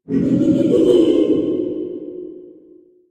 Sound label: crab; Barotrauma; Barotraumatic; Icecrab